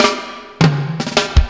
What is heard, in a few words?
FILL TIMB, Ragga & Dub Reggae; USB Soundscan vol.27; FILLACOUS